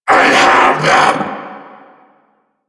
Vocal Attack; Warframe; Duviri; Dax; Vocal Set A

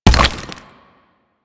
Nechro Mech Step Surface Rock; Step Surfaces

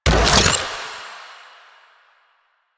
ball projectile hit; Warframe; Stalker

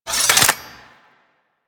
Pole Arm Collapse; Warframe

